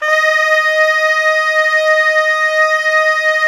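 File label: SOPRANO SAX, Sax section; Giga Samples Collection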